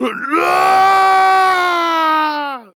falling

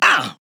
Grunt Hit; paladins; Barik

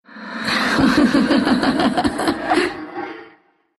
laugh, ru; dota2

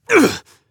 Assassin Grunt Physical; Anarchist; Realm Royale

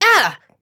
grunt hit; Paladins; Seris

